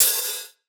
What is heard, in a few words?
Future Bass Ride, Cymbals; Drums; One Shots